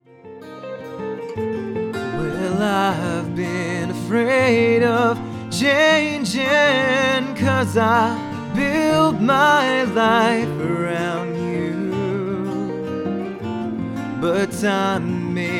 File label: Singing